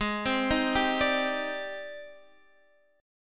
G sharp Major Sharp 11th, Guitar Drop D; x,11,10,8,8,10

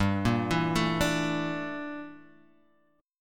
G Minor 6th Add 9th G

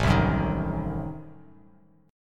A Diminished 7th A down Bass Standard; Adim7 strummed; JGuitar

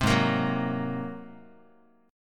Ab 9th Ab down Guitar; Ab9 strummed; JGuitar

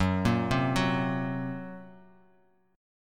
F sharp Major Flat 5th F sharp Bass Standard; F#Mb5 arpeggiated

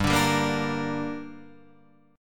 G Minor G, Guitar Standard; strummed; 3,5,5,3,3,6; JGuitar

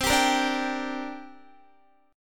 C 7th Suspended 2nd, Guitar; C7sus2 strummed

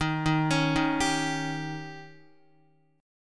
Eb 7th, Guitar; x,6,3,6,3,3; Eb7 arpeggiated; JGuitar

